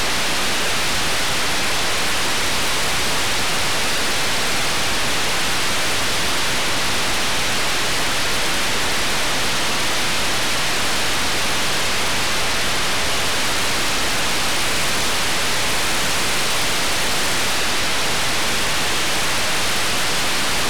White Noise Control

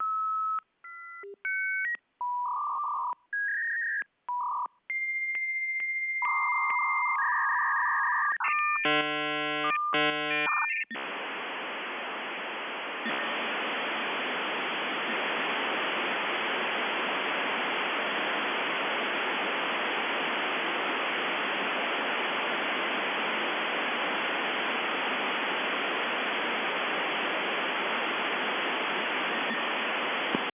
acer acermodem 56 surf

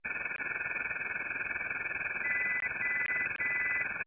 Beeps 45dB

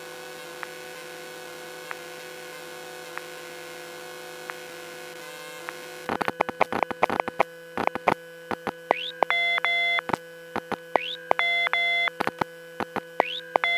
seagate cheetah read error retry

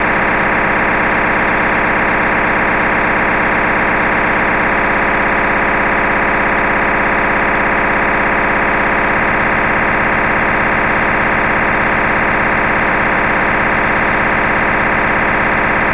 Orion Control Channel Kurrajong

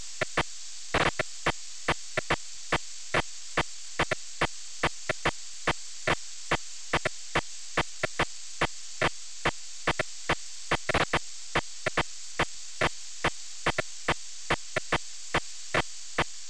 Burst FSK Data

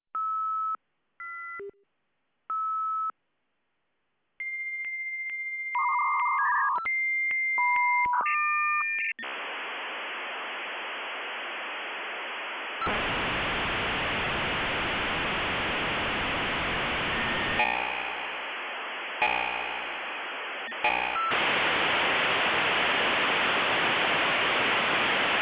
USR Message V92 bong bong bong

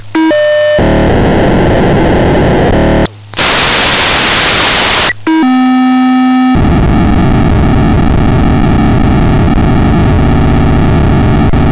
pocsag mixed

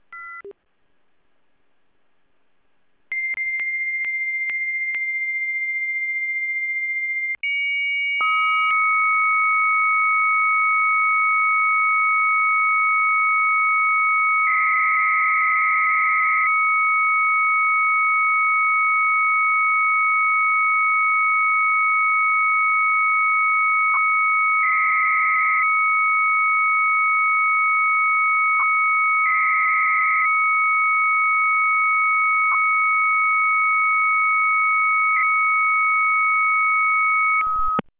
Short 300bps

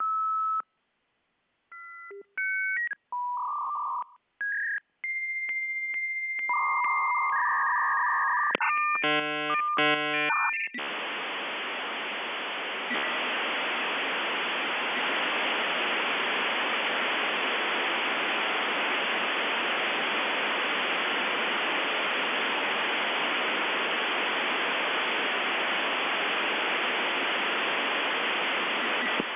netcomm roadster II

